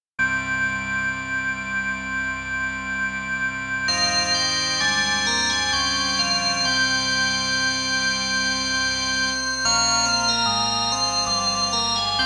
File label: organ